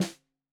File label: Snare 65 Reg Stereo, Deeply Sampled Drums